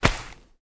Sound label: sand footstep; metrocraft